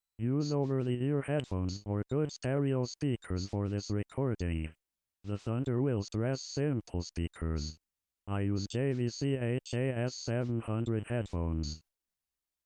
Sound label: rain